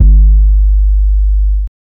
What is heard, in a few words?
electric bass drum